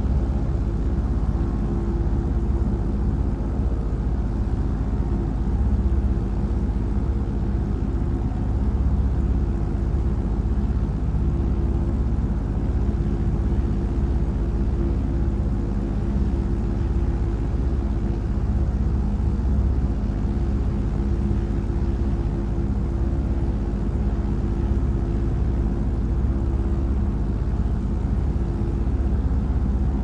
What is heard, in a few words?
cessna, helicopter and planes